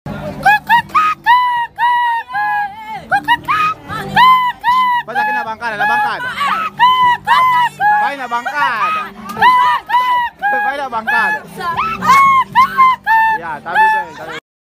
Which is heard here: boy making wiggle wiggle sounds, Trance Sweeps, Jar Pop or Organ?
boy making wiggle wiggle sounds